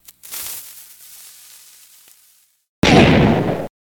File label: Creeper Explosion Sound